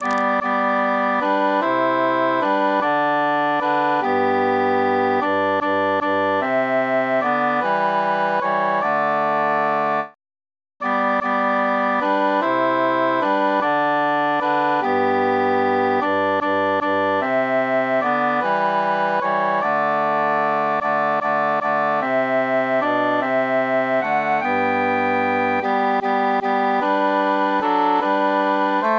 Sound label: altus mute; of all the birds